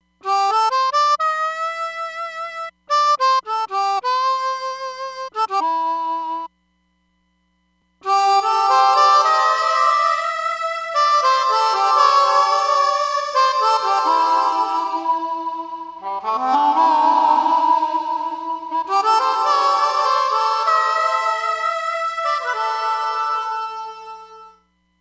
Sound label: Panning Bounce Gate